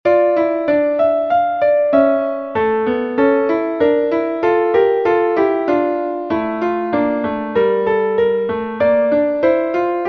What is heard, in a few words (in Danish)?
Menuet